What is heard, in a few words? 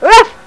puppy; Old MacDonald